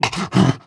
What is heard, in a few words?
breath short, zombie